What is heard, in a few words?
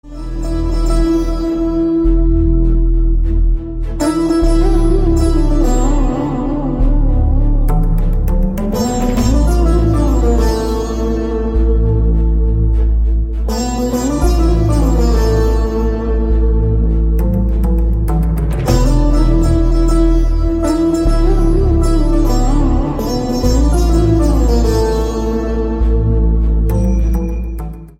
BGM Piano Ringtone; Akhanda Haindhavam